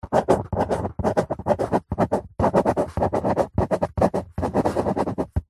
office handwriting pen on paper on cardboard box fast; zapsplat